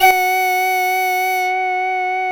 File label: voxy F#3; Best Service Alpha Dance vol.2